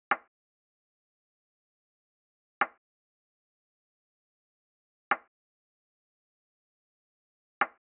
Drum Loop - 96 BPM Percussion, Latin; Cymatics; PARADOX II; Drum Loop Collection; Campari

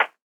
Lofi Percussion

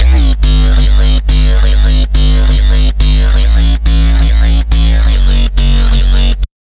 Bassline C3 140, Dubstep; GhostHack

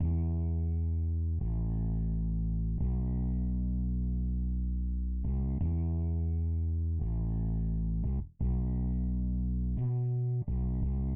86 BPM E Min Bass; Cymatics; Gems Free Teaser Pack; Cymatics Gems Vol 9; Dreamers

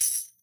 Tambourine Large; World Percussion; Cymatics; Emperor World Percussion

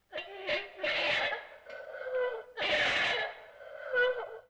Raspy String Can Pull; Vintage Cartoon; Foley

